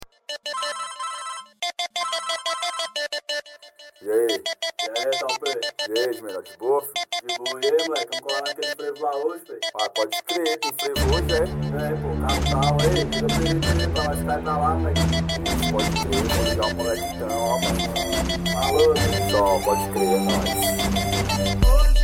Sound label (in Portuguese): rap